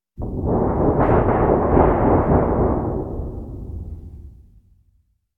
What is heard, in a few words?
Thunder Sheet; horror sounds; Hollywood Edge